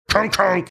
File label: mimicking sound old fashioned pull tab machine